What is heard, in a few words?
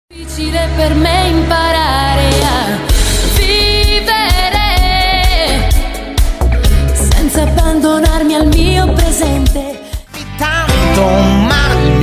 Contemporary Italian